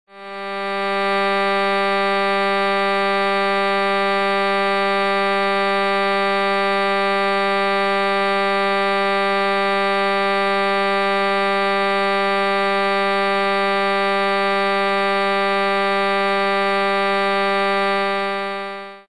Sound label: viola G 3rd